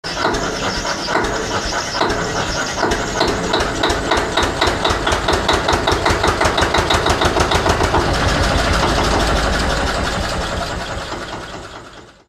Steyr T80 Start

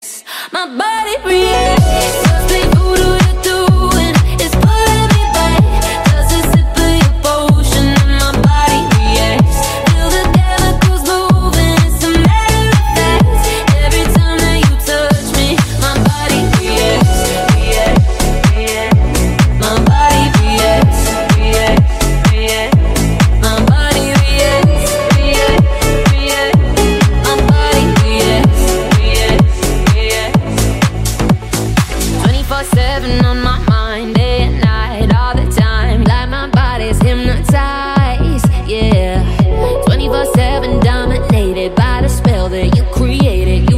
Switch Disco

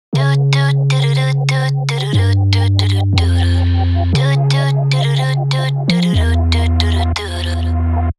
Sweet Melody

